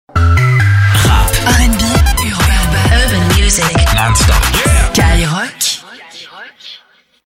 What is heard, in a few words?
RNB RAP, JINGLE; SKYROCK